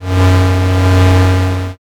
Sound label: space engine